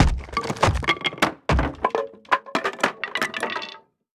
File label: combat atmosphere; World of Tanks